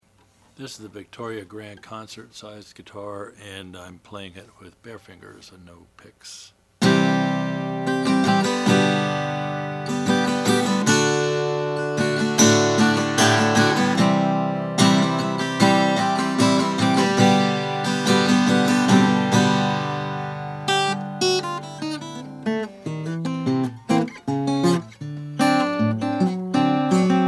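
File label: regal victoria natural, Guitars; 1915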